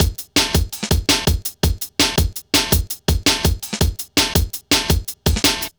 Klanga, drum loops 165.5; Zero-G Jungle Warfare 1, 2 & 3; 90 s Sample CDs

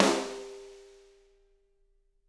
SD, Drums; Bob Clearmountain Drums I

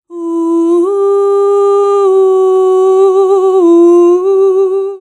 Celtic Voice; Sonic Foundry (Sony Creative Software); Continental Drift Aftershocks; Vocals

